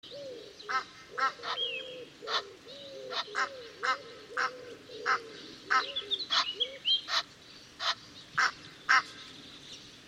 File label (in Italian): Oca egiziana, Canto degli uccelli; conoscere gli uccelli